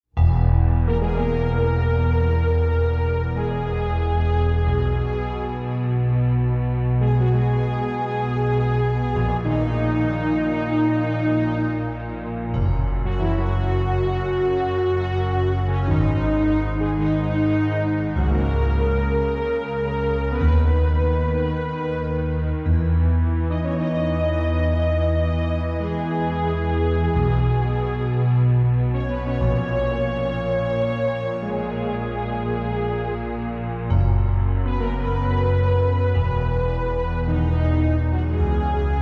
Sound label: fanfare